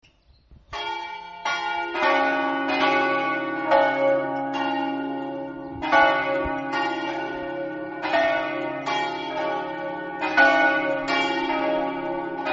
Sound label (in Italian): camino, campane